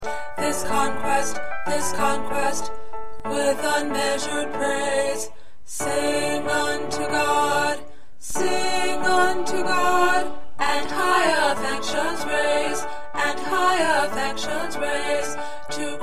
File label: 1st Tenor